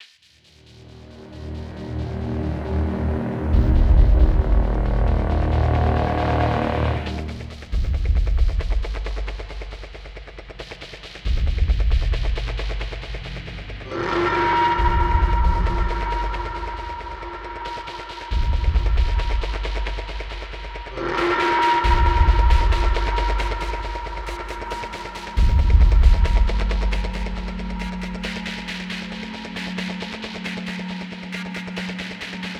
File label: Stealth Tension; MP Suspense; Oneshot